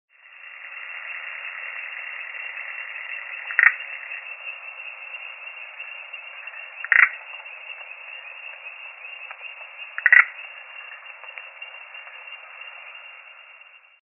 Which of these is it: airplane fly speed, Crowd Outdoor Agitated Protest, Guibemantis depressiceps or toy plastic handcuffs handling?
Guibemantis depressiceps